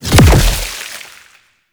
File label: Impact B; Gouache; Warframe; Follie